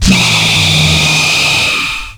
SHIP FX; Big Beat Samples & More; AKAI; OTHERFX KIT

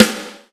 XV Snare, Roland XV-5080

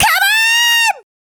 Dance Vocals, FEMALE

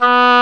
oboe, Orchestral Winds

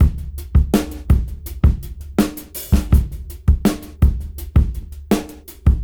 82 BPM; AMG; Keith LeBlanc Phat Butt Jams

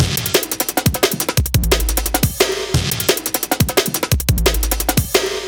175, classic dnb breaks

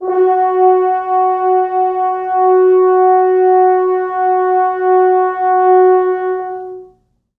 4 FrHorns; F#3; East West; Quantum Leap Horns Sax; 4 FrHorns MOD

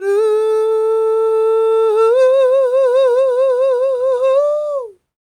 CROON, Soul; Spectrasonics; Vocal Planet; Blues; CW SOUL

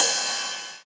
Ride, Rides; Electronisounds

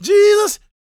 GOSPEL MALE; Spectrasonics Vocal Planet

